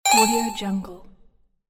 puzzle sound series

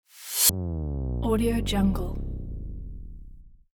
bass drop

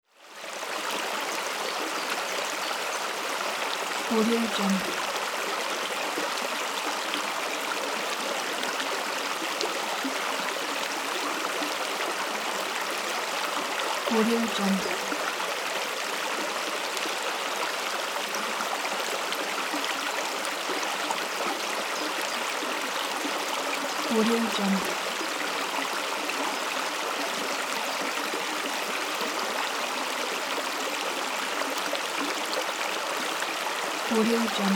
stream with water over rocks; mountainaudio

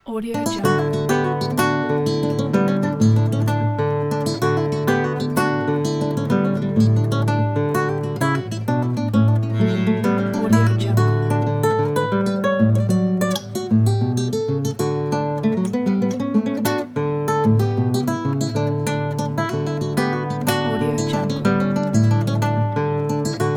canarios; gaspar sanz

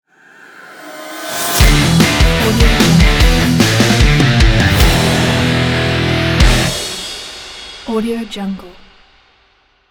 metal logo

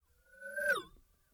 electronic zing pass by swirly

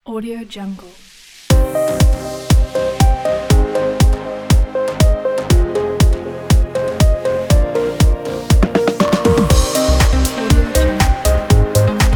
modern tech pop corporate; soundmanmaster

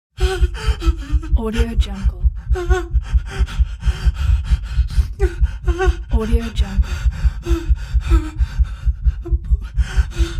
heavy fearful breathing with heartbeats

